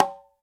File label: Conga Battery, EMU E-III; Samples vol.55 - Retro Sampler